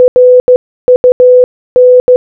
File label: RUN Morse Code